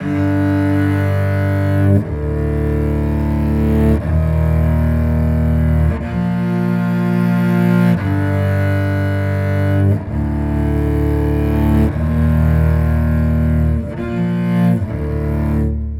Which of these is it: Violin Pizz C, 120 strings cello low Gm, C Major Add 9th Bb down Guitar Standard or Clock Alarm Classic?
120 strings cello low Gm